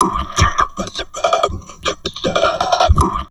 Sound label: TALK, Drum Loops Crazy Processed; 160 TALK A; USB Soundscan vol.07; AKAI